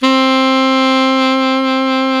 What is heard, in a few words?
SAX ALTO MF, Tube